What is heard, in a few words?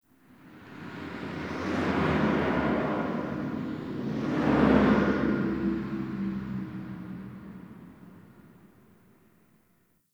Distant Traffic G; Warframe; Ambience; NineteenNinetyNine